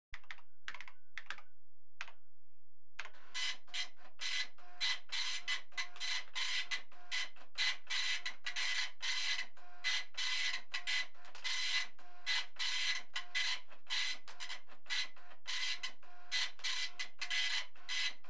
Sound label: Computers, Telex AND Typewrite; Computers Printers Phones; BBC Sound Effects Library